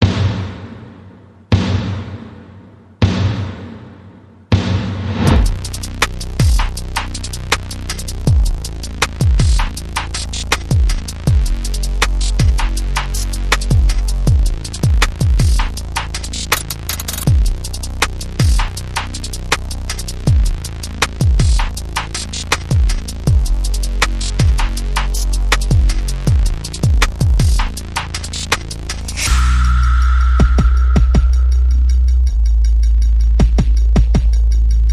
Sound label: Percussion Bass and Effects